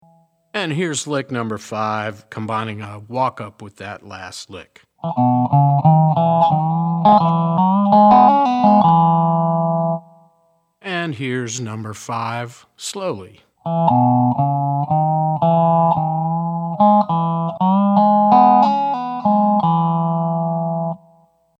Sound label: resonator pedal, steel guitar; mxr custom shop resonator ii pedal